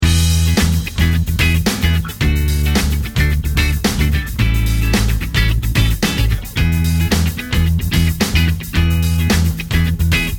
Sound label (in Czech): hudba gameplay; sweetcandymania